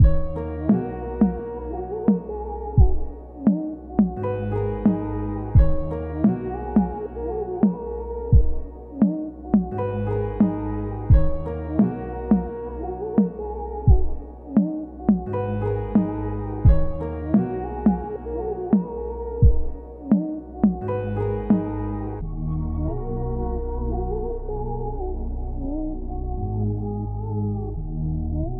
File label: 173 BPM A# Min, Melody Loops - RnB; Reasons